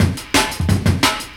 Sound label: 175, Breaks; Drum&Bass; EZ Rollers